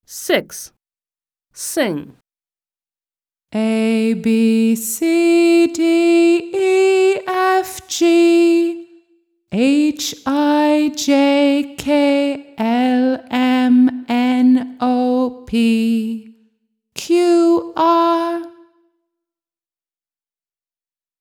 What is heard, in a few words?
song ABCDEFGHIJKLMNOPQR, American; My alphabet book; Smart Book 1